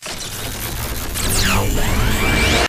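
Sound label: spectre overload mid distance; Titanfall 2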